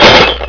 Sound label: Shattered Glass; Troubled Souls